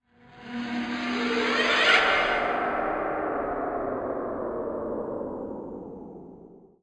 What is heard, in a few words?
SPACE FX 140; SpaceSounds